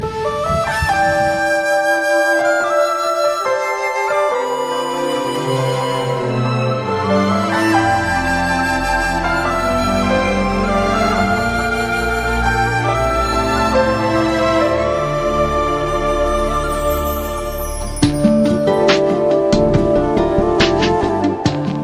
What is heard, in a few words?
Melody